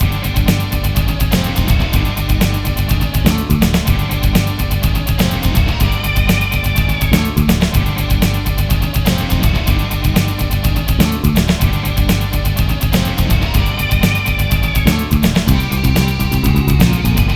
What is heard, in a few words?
Bonus; Blood; reworked MIDI soundtrack